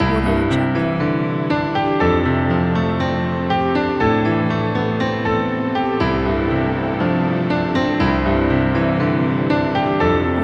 atmospheric piano logo

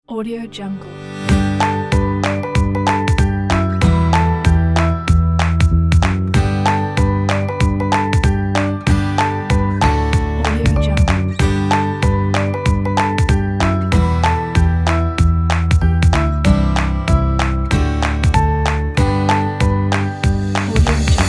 happy kids music pack

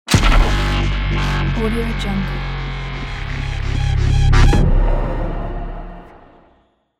shutdown

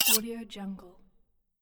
knife scrape

